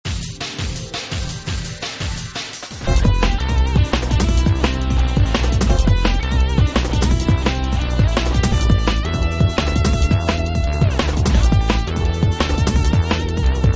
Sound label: Action - Electronic